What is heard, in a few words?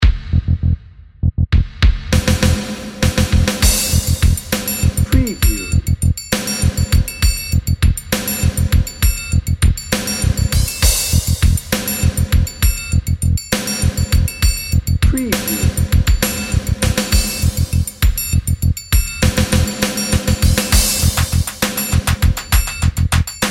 celtic pop